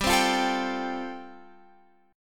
G 7th Suspended 2nd Suspended 4th, Guitar Open F; G7sus2sus4 strummed

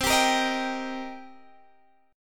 Eb Major C; strummed; Eb/C

